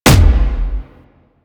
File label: Explosion Punch Generic Large; Warframe; Weapons